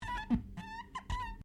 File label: Glass Squeak Rubber